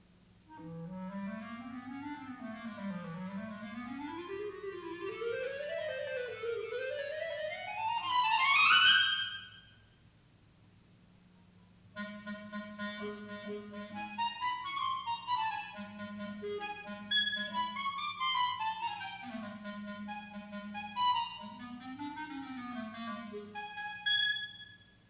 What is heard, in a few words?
clarinet; donald martino set for clarinet 1st mv